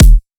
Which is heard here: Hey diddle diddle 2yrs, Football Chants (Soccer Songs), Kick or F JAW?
Kick